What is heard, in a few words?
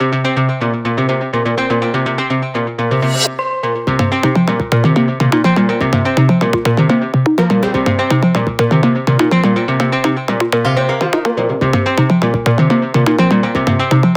Battle Dance